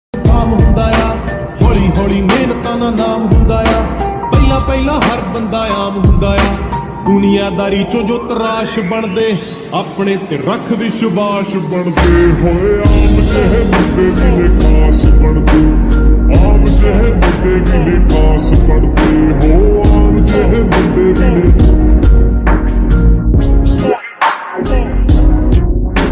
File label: slowed reverb lofi ringtone